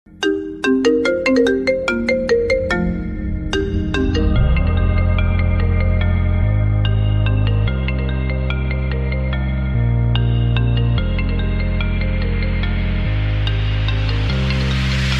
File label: Apple Ringtone